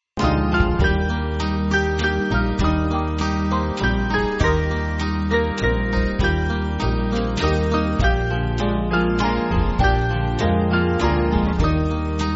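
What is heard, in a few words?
Band, Backing Files for Churches; You Have Longed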